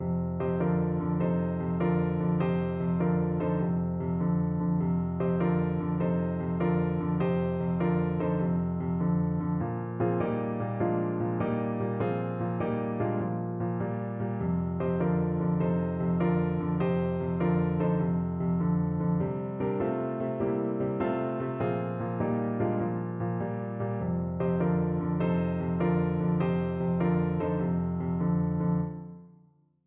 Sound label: shuffle